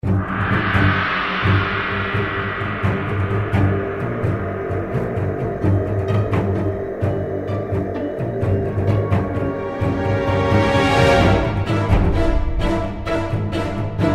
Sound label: Boss Battle Theme